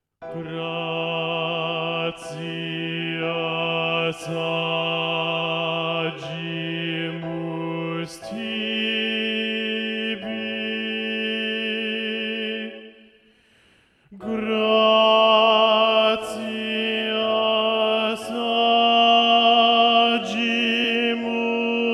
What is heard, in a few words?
Gratias agimus tibi and Propter (Gloria) - Bass Predominant; Vivaldi